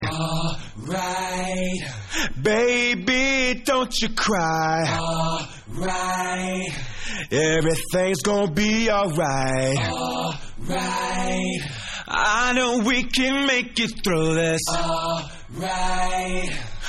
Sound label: Acapella